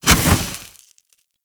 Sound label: Fire Stop, Flame Thrower; Weapons; Warframe; Ignis Wraith